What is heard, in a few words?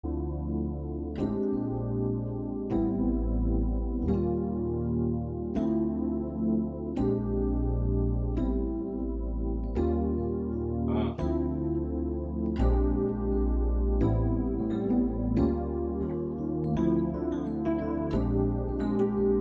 piano interlude